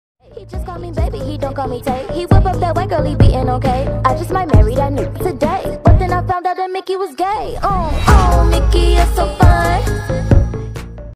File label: korean ringtone